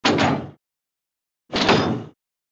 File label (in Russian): otkryvanie otseka na podvodnoj lodke